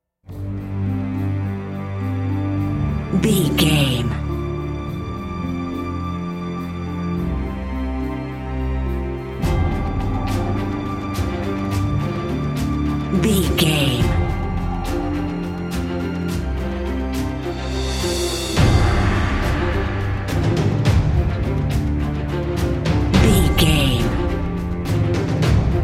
epic cinematic bed

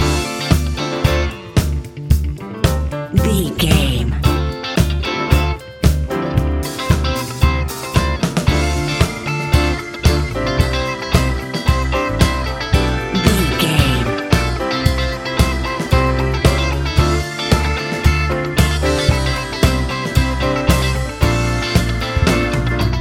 disco fever

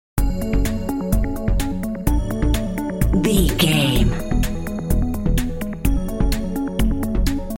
future technology loop

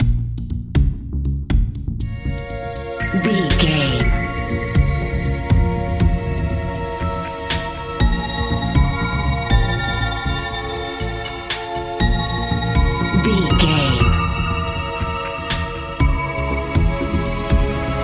mysterious video game expedition

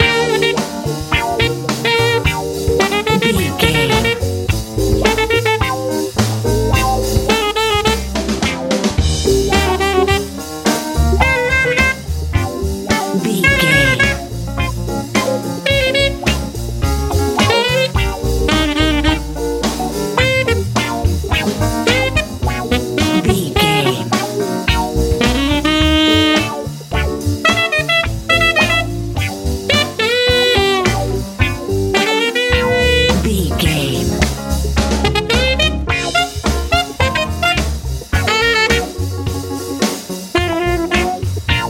bright nice wind sprint love funk

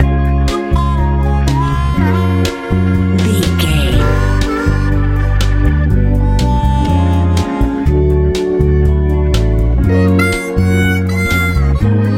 lite pop

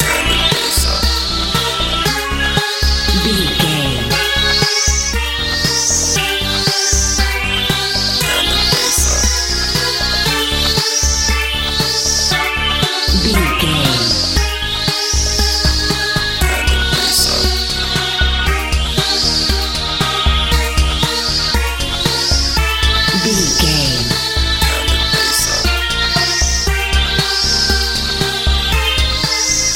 bright nice euro dance

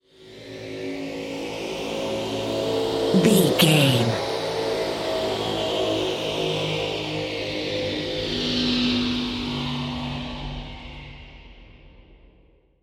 ominous war plane heard small cavern

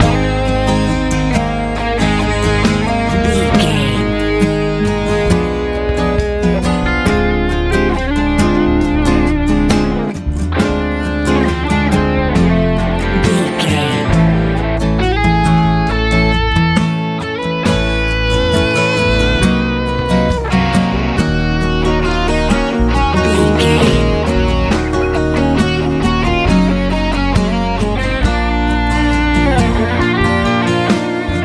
easy pop

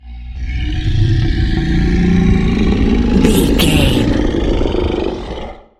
large monster roars

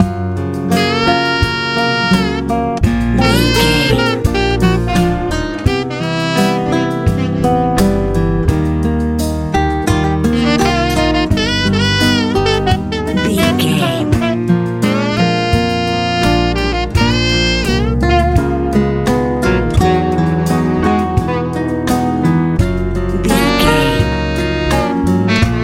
bright warm easy soulful

